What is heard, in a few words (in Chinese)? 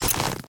修复狼甲声